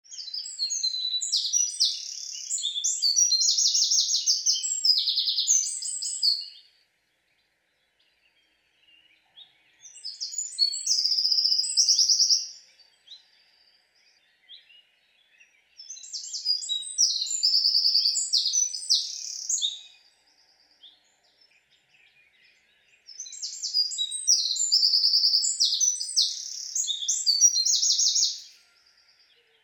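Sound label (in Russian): wren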